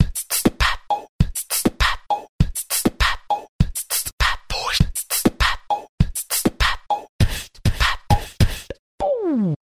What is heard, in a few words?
bad boy boom, Beatbox; Drum loops